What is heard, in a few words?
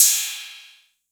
Cymbal, Roland TR-808; Roland MV8800; TR-808mk2